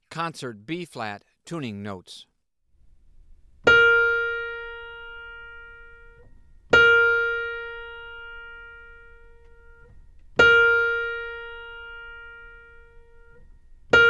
Tuning Notes; James „Jamey“ Aebersold Play-Alongs

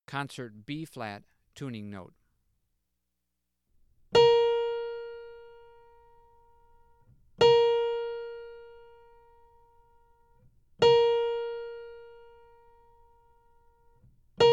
Tuning Notes; James „Jamey“ Aebersold Play-Alongs

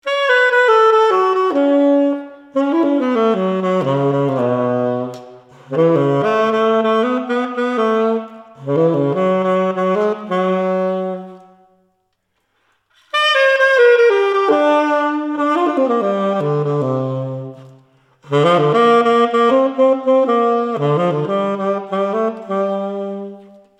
Fanfare; Nils Thoma Eigenaufnahmen (Reha-Klinik, Bad Schandau)

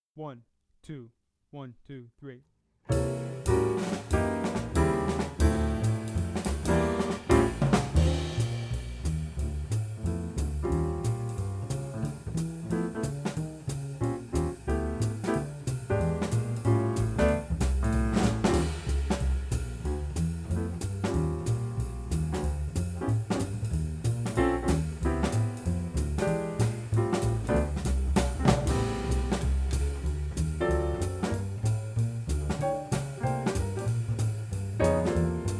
Play-Along, Saxophone; Jazz Conception For Saxophone; 21 Solo Etudes (Eb Saxo)

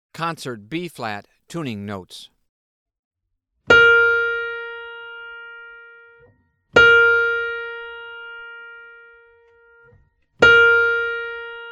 Tuning Notes; James „Jamey“ Aebersold Play-Alongs

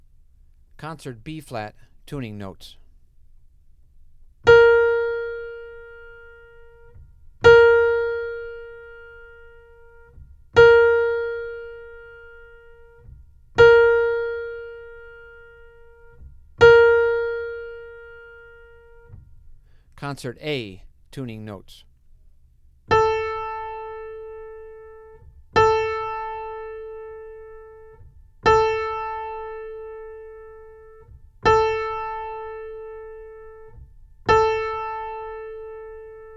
Tuning Notes; James „Jamey“ Aebersold Play-Alongs

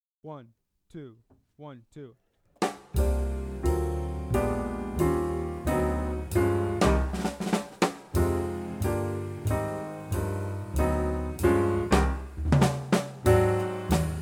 (Play-Along), Saxophone; Jazz Conception For Saxophone; 21 Solo Etudes (Eb Saxo)

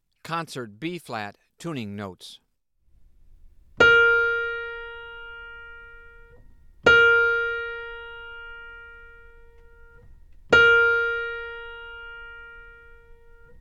Tuning Notes; James „Jamey“ Aebersold Play-Alongs

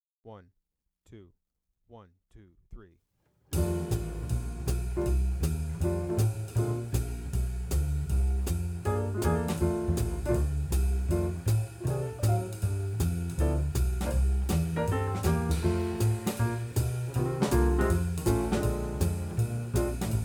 Amen Play-Along, Saxophone; Jazz Conception For Saxophone 21 Solo Etudes (Eb Saxo)

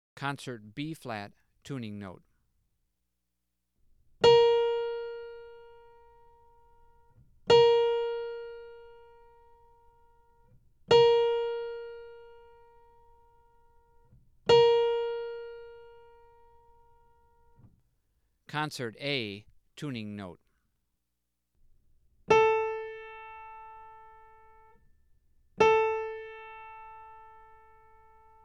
Tuning Notes; Play-Alongs

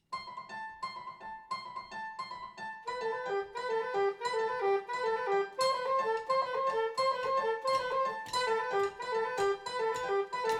Solo; Probe; Zwei Kämpfer (synchron, souverän, aggressiv)

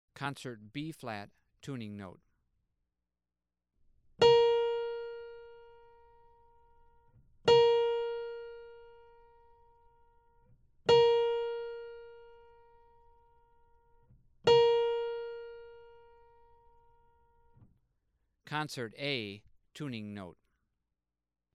Tuning Notes; James „Jamey“ Aebersold Play-Alongs

